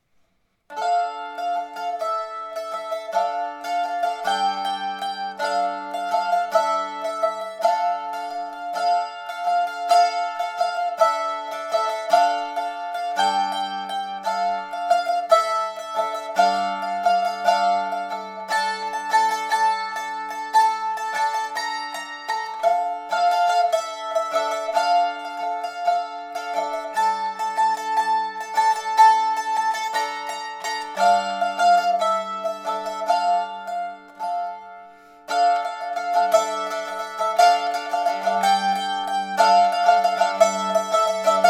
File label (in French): mandoline